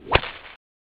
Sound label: Whip